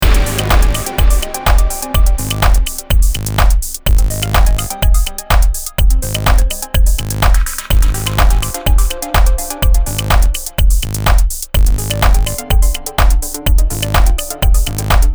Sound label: techno; Inspire Audio Selected Techno Signatures